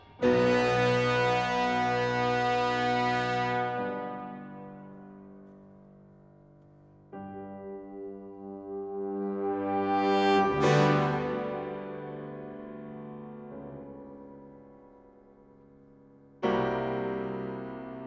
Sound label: Concerto for Piano and Orchestra; Mussorgsky; Pictures at an Exhibition; L Leonard; Geoffrey Simon